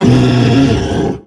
attack launch; Thalassophobia Restored; Humanapostle

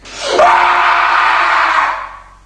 scream; Barotraumatic; Deepseaclownnew

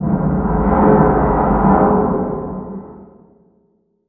Hull; Room FX; Barotrauma

